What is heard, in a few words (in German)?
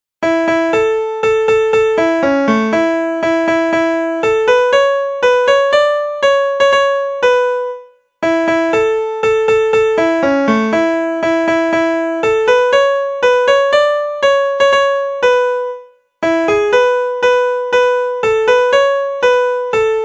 Preussisches Reiterlied Instrumental